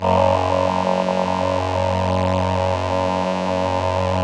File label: VP-330, String Machines